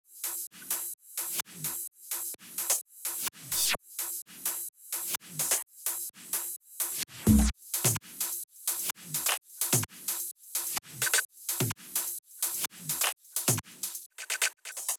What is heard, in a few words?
Loops 128BPM, Drumloops